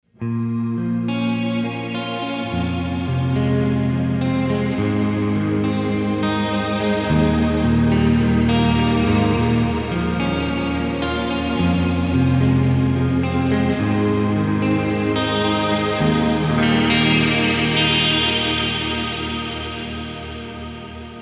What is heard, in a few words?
b minor, Shimmer Reverb; Eterna Gold; modified reverb algorithm